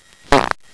fart

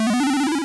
Power Up; Super Mario 3

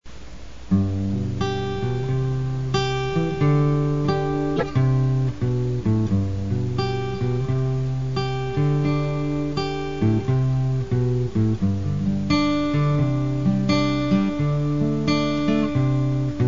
Solo G

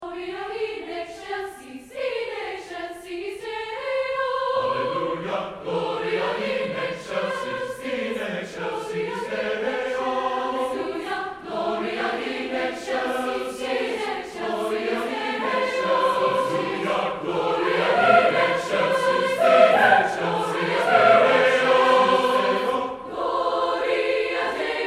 Alleluia Fanfare, State Chorus; Region I State Chorus

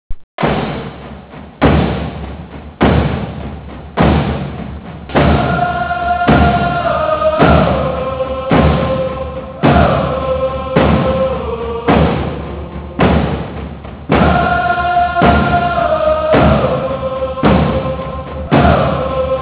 warchant

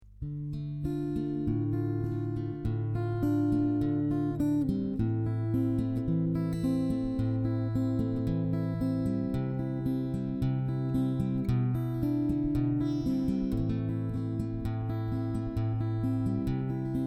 accoustic test

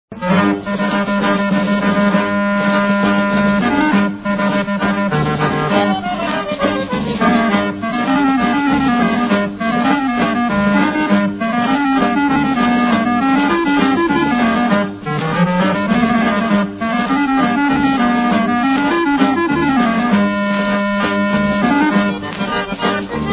organ

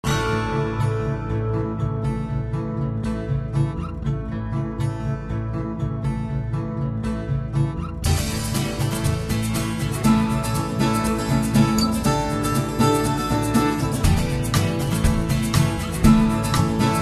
Acousticality

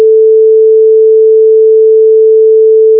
Tone

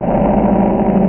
door open

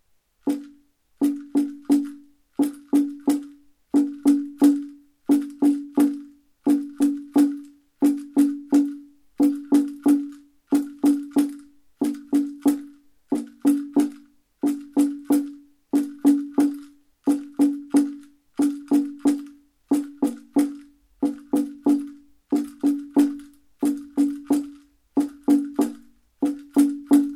Sunset Drum Rattle

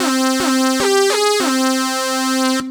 SAW

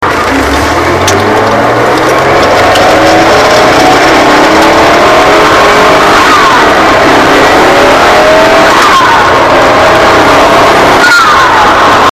Foosh Noise

